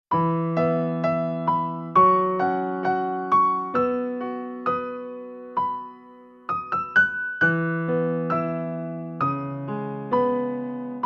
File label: Bao Hu Se Instrument